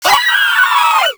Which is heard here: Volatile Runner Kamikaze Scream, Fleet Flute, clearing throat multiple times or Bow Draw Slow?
Volatile Runner Kamikaze Scream